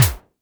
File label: splatter; cake murder adventure